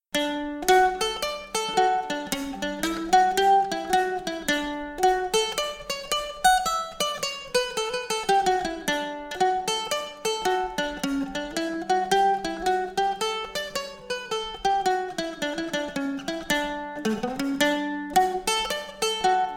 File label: Galway hornpipe, banjo